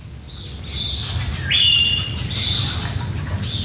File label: jungle, nature